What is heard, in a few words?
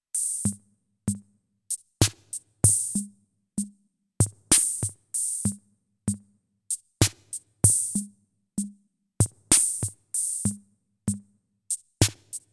Roland TR 8S CR 78S